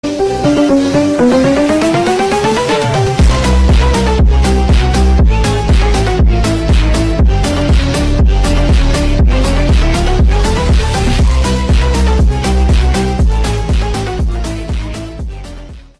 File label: circus phonk